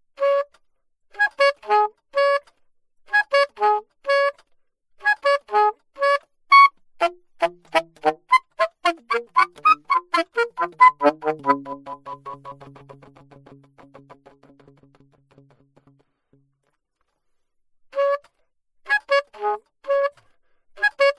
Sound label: altosax